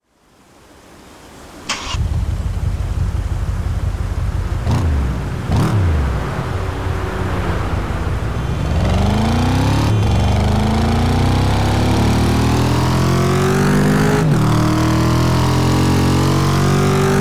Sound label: audi s4